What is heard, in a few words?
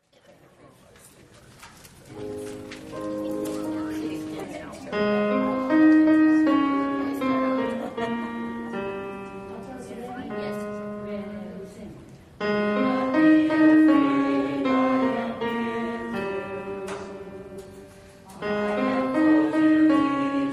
harmony; Gather Hymnal